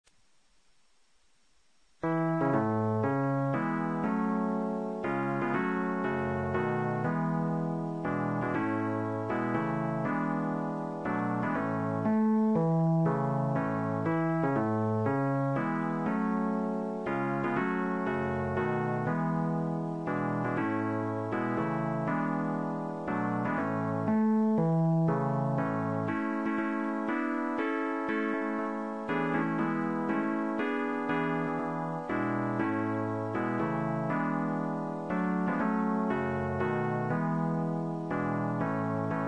Learning Tape MIDI LEAD; The Star-Spangled Banner

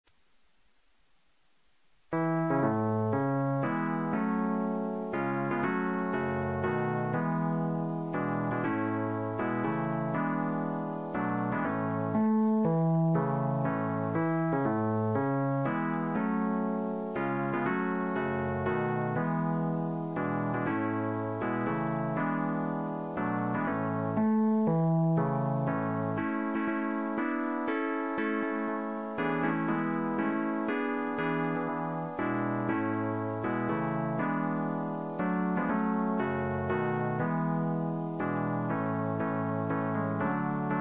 MIDI BARI; Learning Tape MIDI; The Star-Spangled Banner

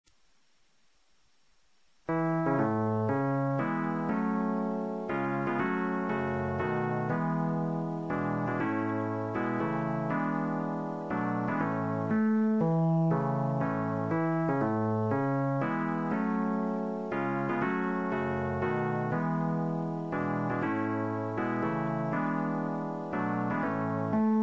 MIDI BASS; Learning Tape MIDI; The Star-Spangled Banner

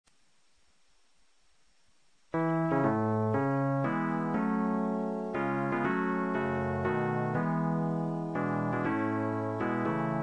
MIDI TENOR; Learning Tape MIDI; The Star-Spangled Banner

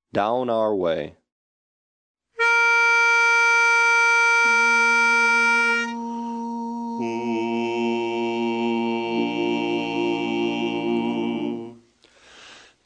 Learning Bass, barbershop harmony

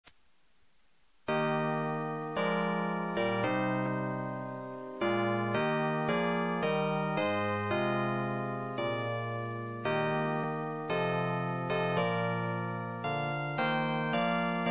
Oh Canada LEAD; Learning Tape MIDI

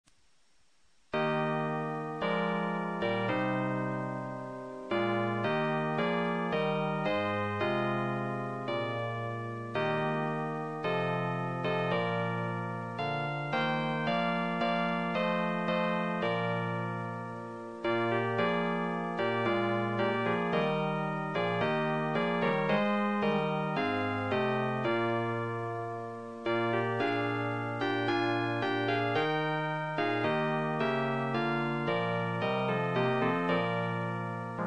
Oh Canada BARI; Learning Tape MIDI

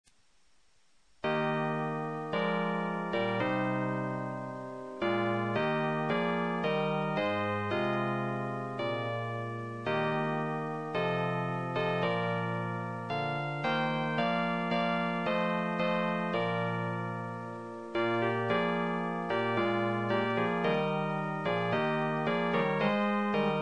Oh Canada BASS; Learning Tape MIDI

